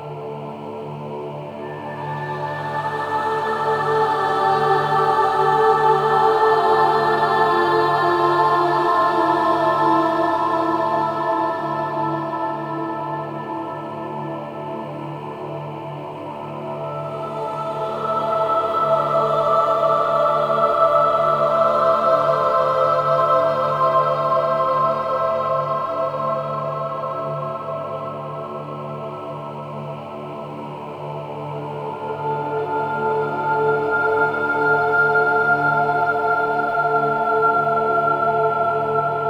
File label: music loop; Diorama; Warframe; Quests; Apostasy